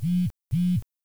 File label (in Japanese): denwa; 電話 ヴーッ; マナーモード ループで